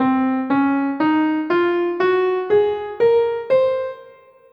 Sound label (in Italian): Do locrio